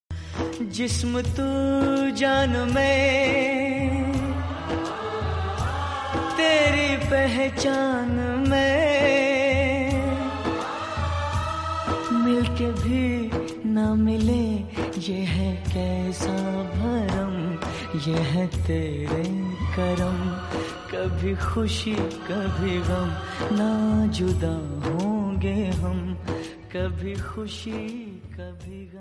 sad ringtone; kabhi khushi kabhi gham

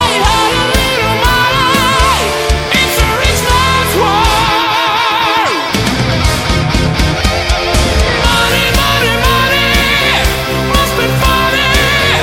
sweden ringtone

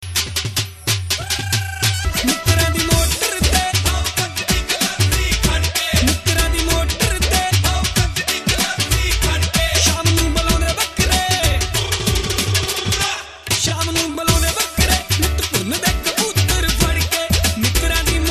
punjabi ringtone

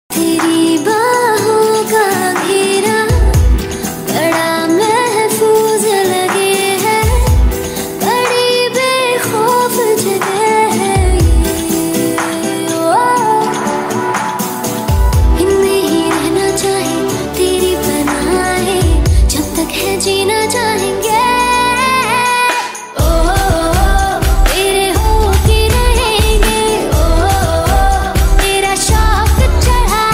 romantic unplugged ringtone

